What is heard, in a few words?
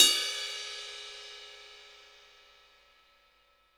ride bell, gretsch; Dirt-Samples